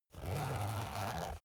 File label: zipper use